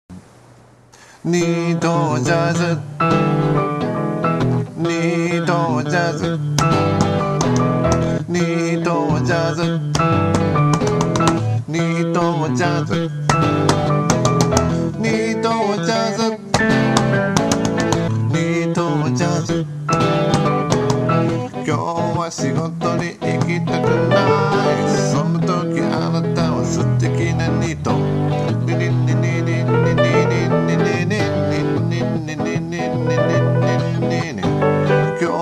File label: jazz